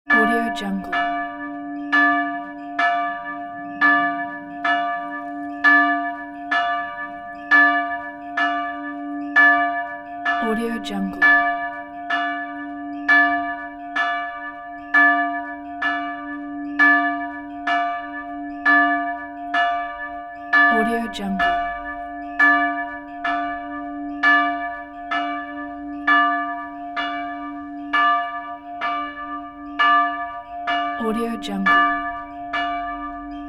single small church bell at noon

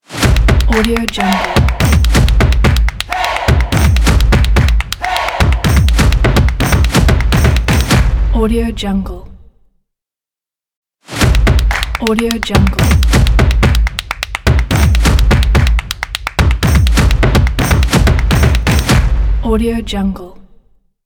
stomp logo; silverhoof

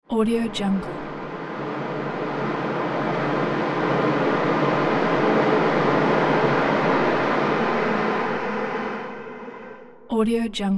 videogaming atmospheric

